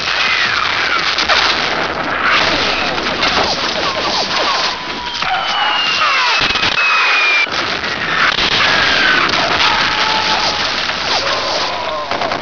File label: radio battle